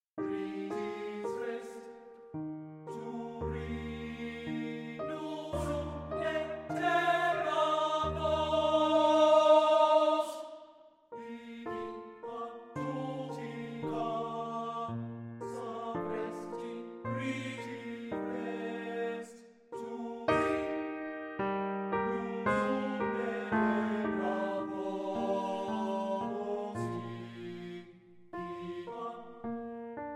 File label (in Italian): Wiegenlied Lied per Canto e Pianoforte Andante; Weber; Wiegenlied an den neugebornen Dauphin; Andante sostenuto